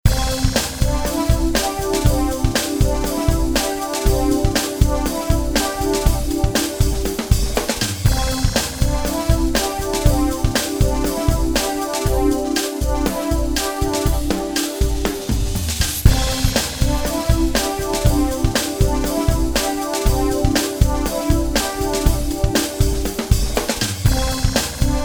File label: bed taking a happy run